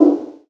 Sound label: perc; Slate; SD CAICOS Hip-Hop Sample Pack; selfie